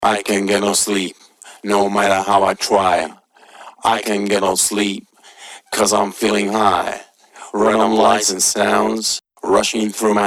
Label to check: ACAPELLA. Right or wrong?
Right